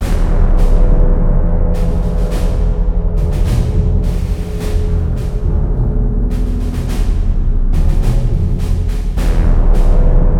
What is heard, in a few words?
music; Quake 4 Alpha; intro cinematic